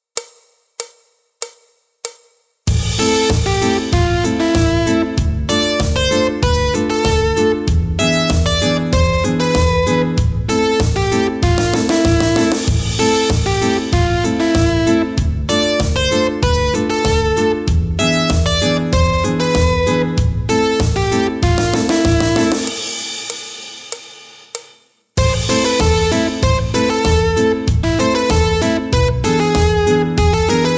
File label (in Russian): соло гитара